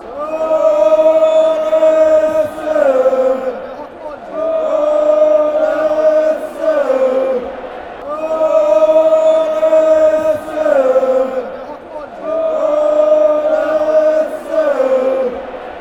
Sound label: aalesunds, fanchants